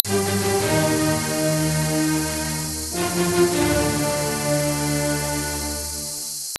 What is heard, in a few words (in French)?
bruit